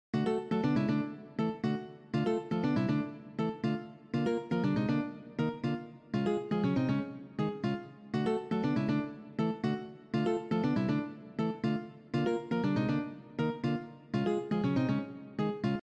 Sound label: cont rhythm example